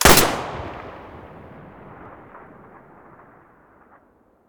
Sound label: muzzle hpsa stereo, weapons